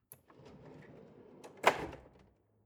drawer large draw open lock long

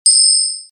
high frequency cut

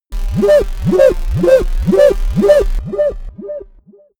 shot techno wobble low

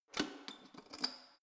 letterbox metal close lock key out soft

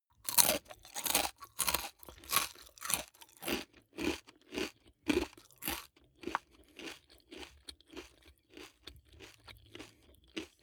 eat large potato chip mouth closed

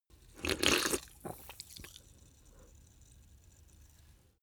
drink soda can small slurp

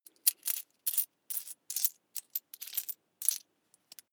coins search push

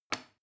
plastic button small release heavy

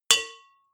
bowl hit

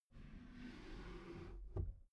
desk drawer open soft

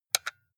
console controller xbox xyab press hard

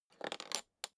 suitcase open latch lock slow

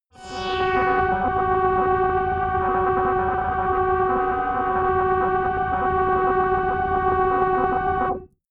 glitch scanner

